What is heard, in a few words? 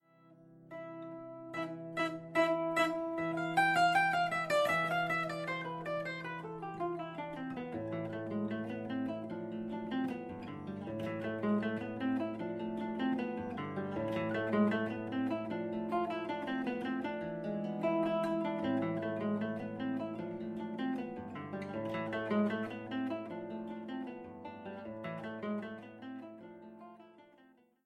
toccata